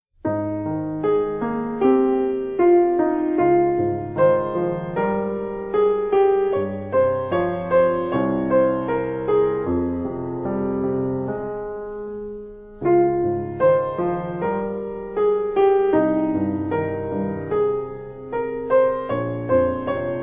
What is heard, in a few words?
Piano ver.; Pasta OST